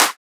Riddim Clap

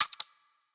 Hihat, Dubstep

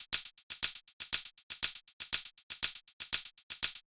Loop Percussion 120; Loops; Ghosthack; Advent Calendar 2019

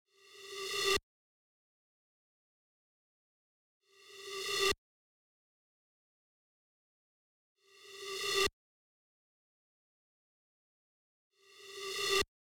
128 BPM E Min Reverse FX; House; Drop Loops; Cymatics; Goliath

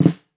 Steel Flam, Snares; Drums; Ghosthack - Free Snares 2023

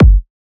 Kick, Techno, One-Shots, Drums

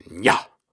Grunts and Chants, Viking Vocal

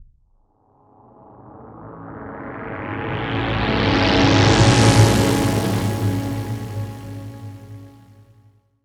Riser

